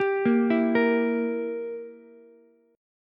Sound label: E Diminished E, Ukulele; Edim arpeggiated; JGuitar